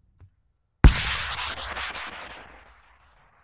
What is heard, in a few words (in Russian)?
zvuk raketnici